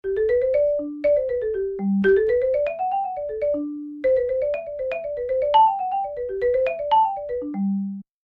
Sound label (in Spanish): Marimba; honduras musical 7mo grado